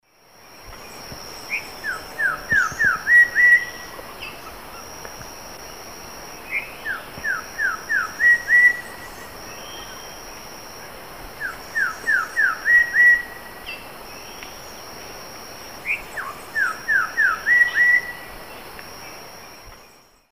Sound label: Saltator coerulescens, song; S. America; Eastern Lowlands